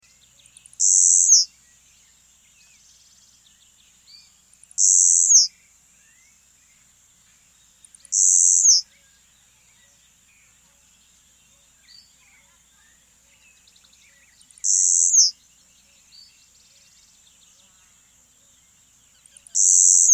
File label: Blue-Black Grassquit, Nature's Songs, S. America